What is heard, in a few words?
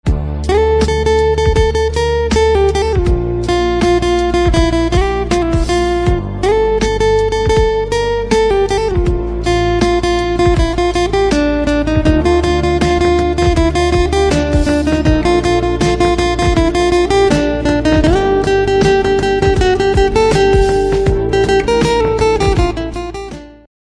Guitar